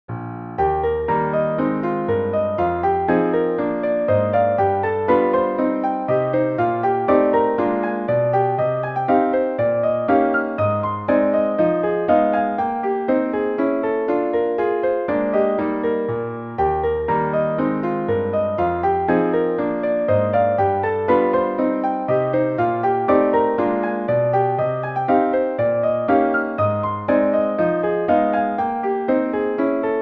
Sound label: piano pseudo rag e flat major; ragtime-like piano solos